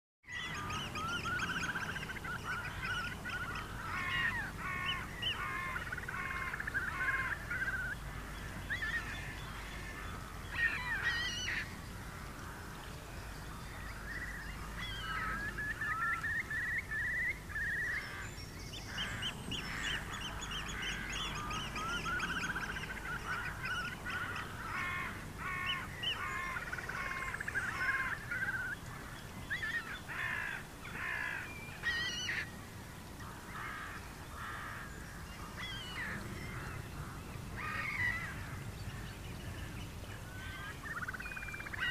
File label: Birds By River; light